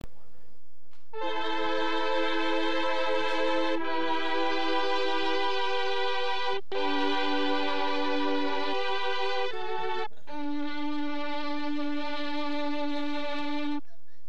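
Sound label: mellotron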